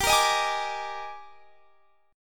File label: Eb Major 11th G